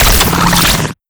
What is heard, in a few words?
electric grab, battery holder; mechanical units